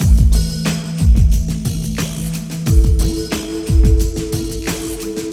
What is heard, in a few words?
Ambi Groove